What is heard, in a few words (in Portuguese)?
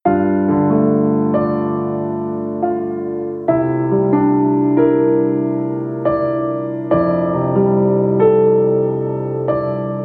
Versao Piano